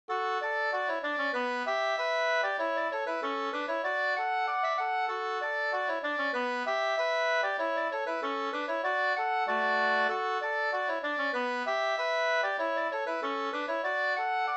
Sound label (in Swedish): polka polkett; e Katrina Lundstedt Hs 2st